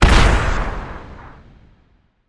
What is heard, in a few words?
big win text change; netent; copycats